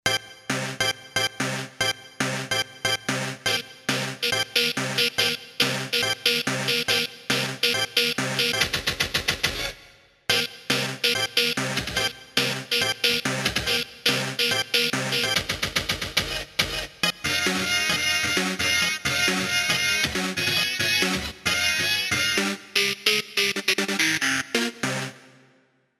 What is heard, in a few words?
Techno Hit; Roland SC-880; Factory Patches